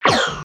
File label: blaster